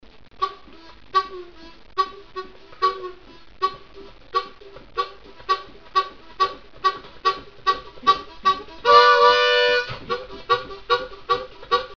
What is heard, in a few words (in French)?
train, harmo